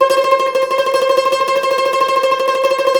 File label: CUMBUS TR, Cumbus Tremolo